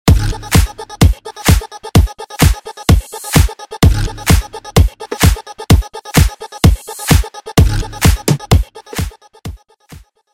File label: 90s Mainstream Bootleg 128; Vegas Edit